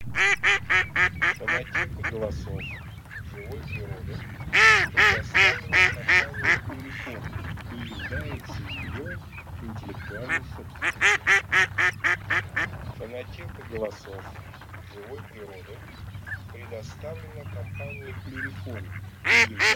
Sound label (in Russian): Кряква Germano; plurifon